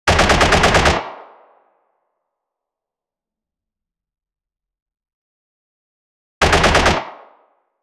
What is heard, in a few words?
various effect of one sho, firearm